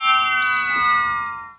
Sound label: sparkle dying